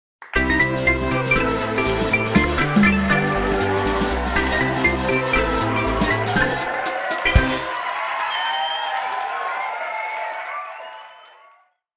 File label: Song Beat Level; paper monsters recut; android; wii u